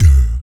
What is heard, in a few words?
DEEP BREATH; Spectrasonics; Vocal Planet; Blues Soul